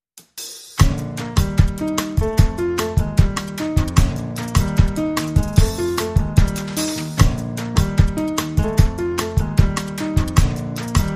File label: Get Moving Bossa